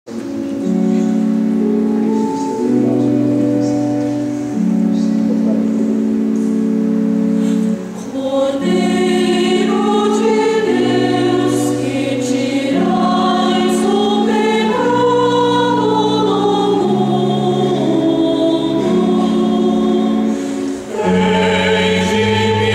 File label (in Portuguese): Cordeiro de Deus Missa